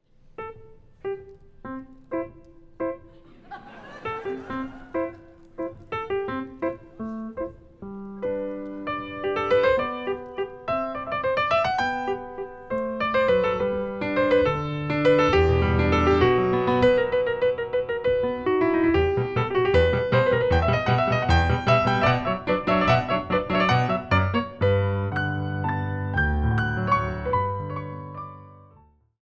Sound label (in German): Medley aus 20 Songs Live